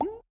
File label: Slime Vox Small